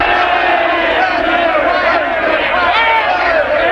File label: ANGRY SHOUTING; people